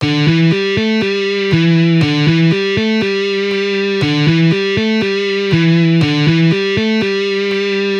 Easy Guitar Riffs

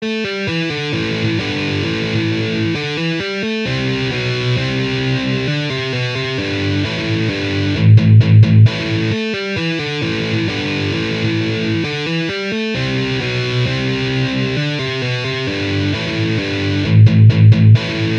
Metal guitar riffs

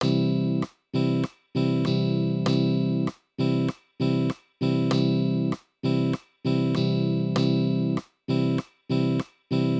Strumming patterns, guitar

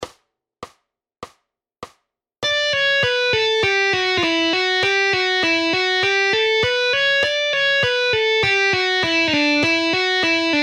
Guitar speed exercises

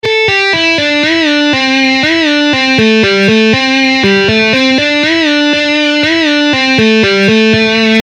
Guitar scale exercises

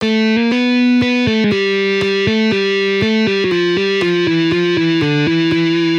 Easy guitar riffs on 1 string

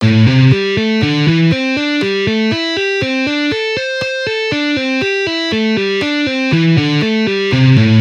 String skipping, guitar

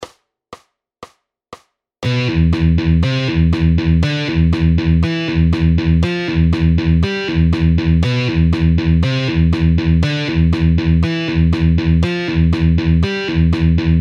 Advanced guitar exercises

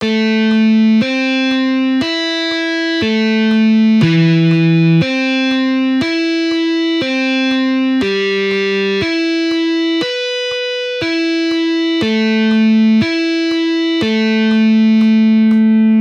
Arpeggios on guitar

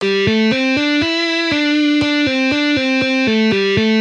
Easy guitar licks

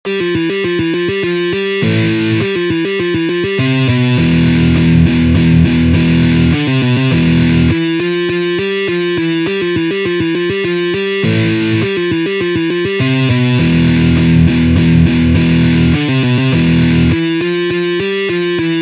Electric guitar riffs

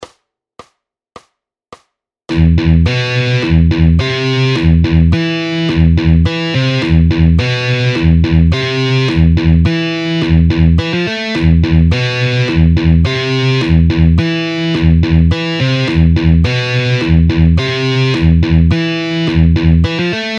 Rhythm guitar exercises

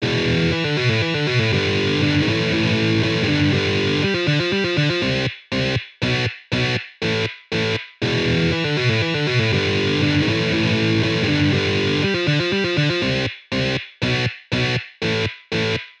Metal guitar riffs

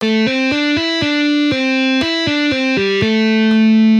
Easy guitar licks

